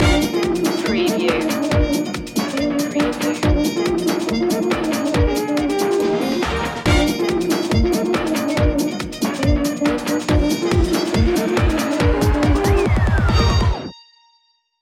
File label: shuffle kerfuffle stinger